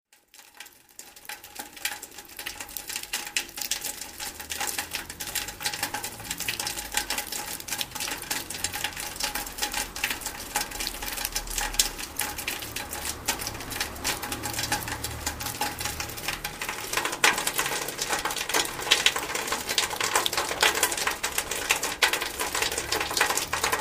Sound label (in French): Gouttiere